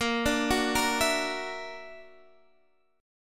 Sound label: F sharp Augmented 7th A sharp, chord